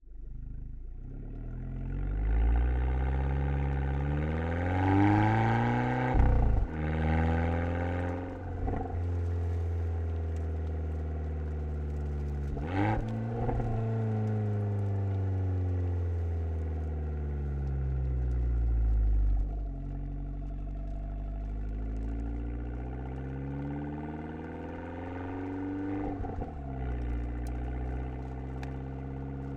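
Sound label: fiat 500 onboard fast drive short stop off exhaust left; Pole Position; DPA4062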